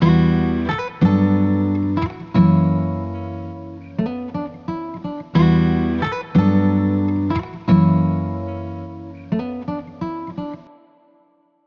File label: Acoustic Guitar Loop Wet - 90 BPM B Min; Melodics; Cymatics; Eternity Sample Pack; Guitars - Acoustic